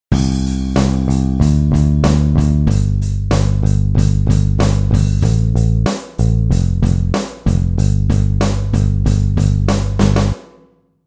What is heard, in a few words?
drum bass